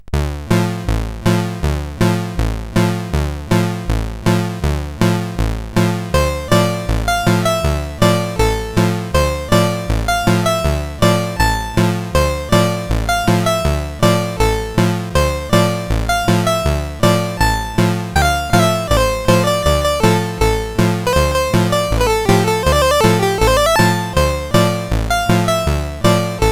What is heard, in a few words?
Waltz, CSG8580R5